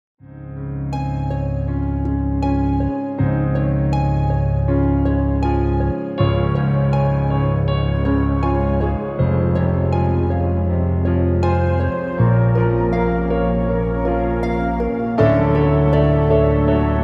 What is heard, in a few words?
Instrumental Piano